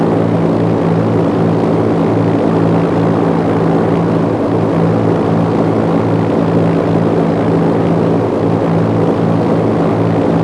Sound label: helicopter engine mid; Rust